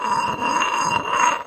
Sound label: IRONWARE, IRON WARE HIT; 1000fx